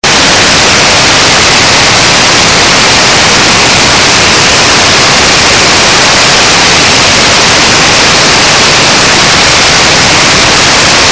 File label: Morning Noise Broadcast